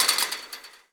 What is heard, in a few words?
fence; firefight